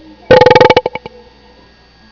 BERRP

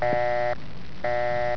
BUSY

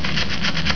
BRUSH

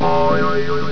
DOYNG

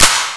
KLASCH

